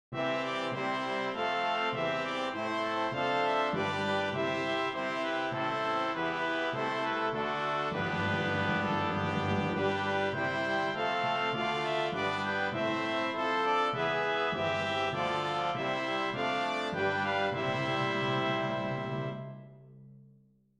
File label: Hymn Brass